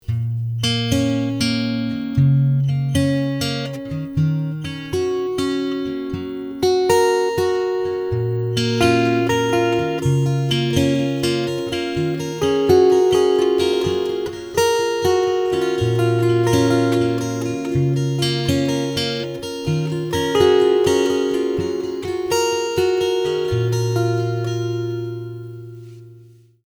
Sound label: Martin D18